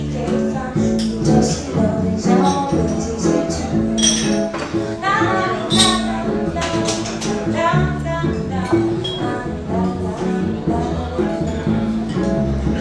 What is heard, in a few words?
jazz singer